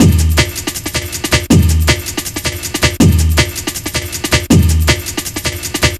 160, Drum Loops, Drum & Bass; Total Drum&Bass

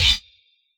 Hit H, Yamaha DJX

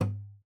STOP, GUITAR FX; Xsample VOL-2

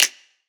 Snap; Antidote Advent; Infected Track Drums

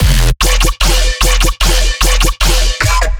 bass loop classic pattern 150 E; Virtual Riot

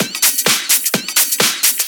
Discohouse Loop 128 BPM

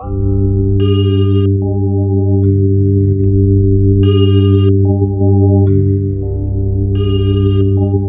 C1, Synth Line; 90 s Sample CDs; NiceBeats; SynthLine VOL-17

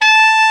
TENOR, Tenor Sax; Tenor Sax 1

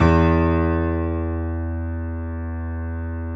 JAZZ E1 F, Keyboards Acoustic; USB Soundscan vol.17

